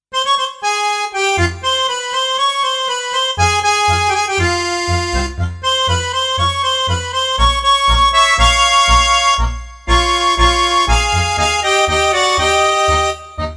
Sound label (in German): Harmonika Tango